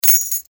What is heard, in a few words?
dropping key; haus-garten